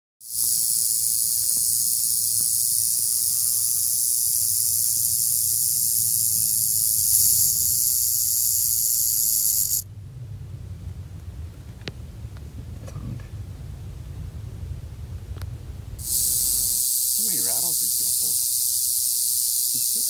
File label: rattlesnake; مار زنگی